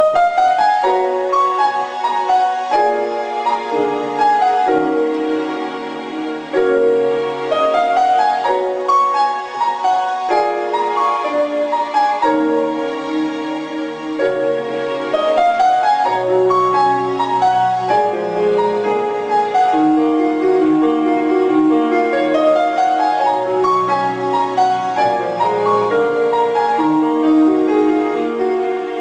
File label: piano ringtone